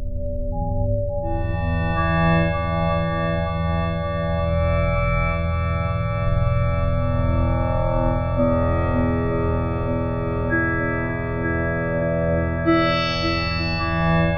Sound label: FM DELAYS; Bolder Sounds - Granular